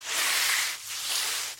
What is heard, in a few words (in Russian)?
Звук протирания